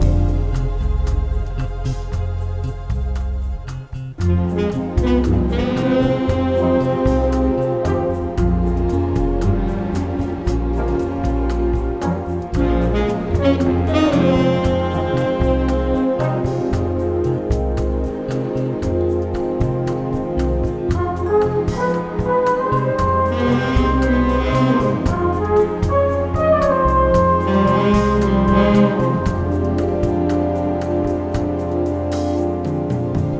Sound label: Menu; Incredibles; gamecube